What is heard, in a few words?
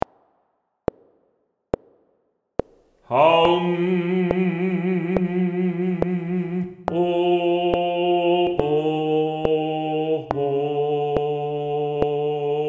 Bass 1, lydspor